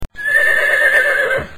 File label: skill horse